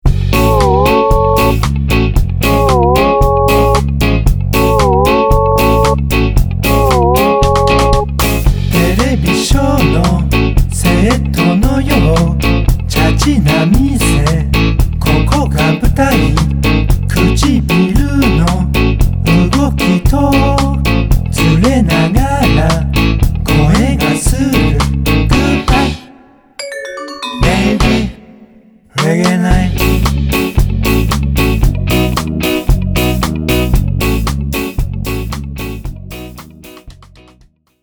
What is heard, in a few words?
Reggae